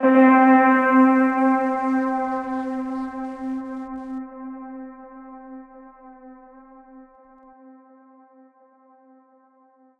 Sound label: Synth Pad C, Lo-Fi; HipHop; Melody Kits; 84 C#m; One Shot; Ghosthack; AC2024 - Day 5; Kit Glow